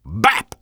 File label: gunslinger punch; Engineer